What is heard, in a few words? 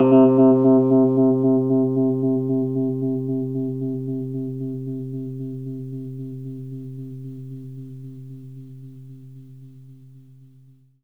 Rhodes fast, 3D Keys; 3D Sound; 3D Keys VOL-1